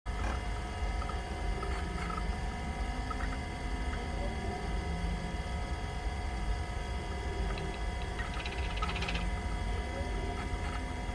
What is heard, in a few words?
ambience